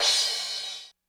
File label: Crash, Korg DSS-1 Drums